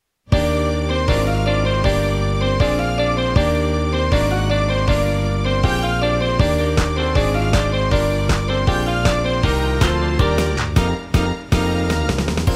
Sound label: fue